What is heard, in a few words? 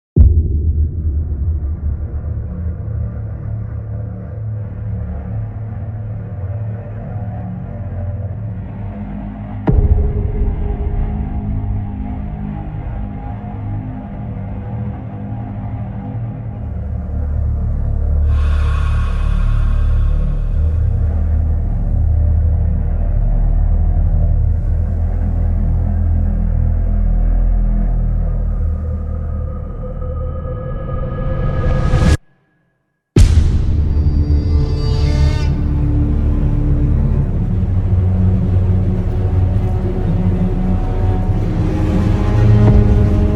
production music epic eerie horror sound design